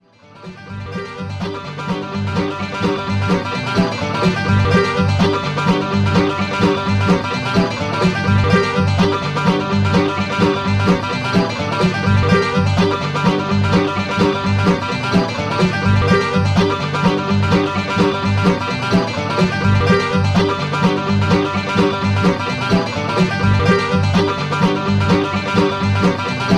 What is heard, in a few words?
lick; Lost Train